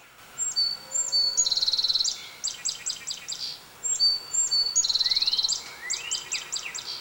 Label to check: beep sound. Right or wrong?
Wrong